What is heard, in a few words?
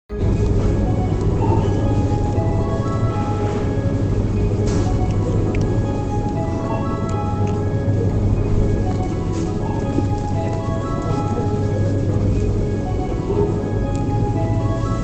shop ambience; Milk outside a bag of milk... OST (2021)